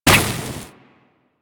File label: Laser Impact; Warframe; Weapons; Corpus; Crewman Laser